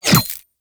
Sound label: Pistol Projectile Pass By; Warframe; Weapons; Tenno; Temple Signature Pistol